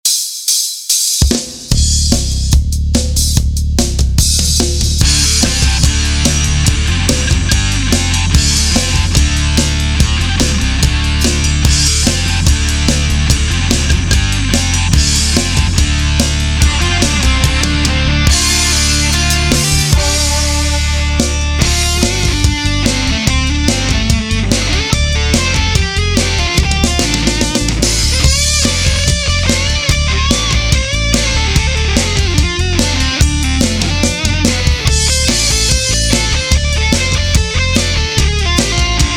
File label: ROCK MOODS ELECTRIC GUITAR SOLO; MUSIC FOR FILMS COLLECTION No2; kSamson Music